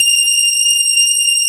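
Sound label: grand piano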